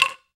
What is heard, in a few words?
ONLONG, World Percussion